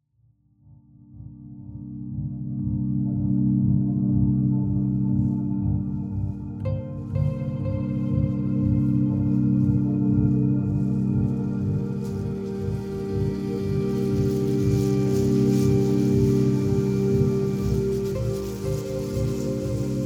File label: instrumental